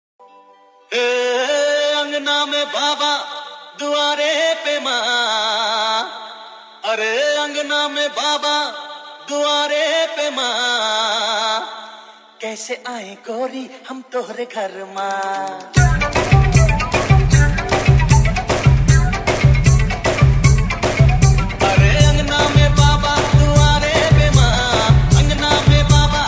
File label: Chhattisgarhi Remix Song